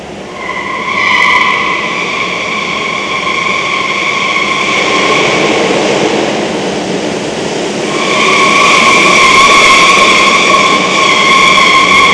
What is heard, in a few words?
DO DONUT, auto; trasporti